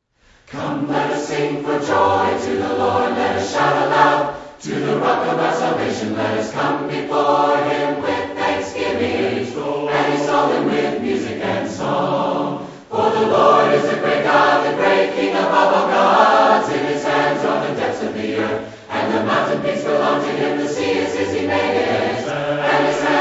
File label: a capella hymn; Come Let Us Sing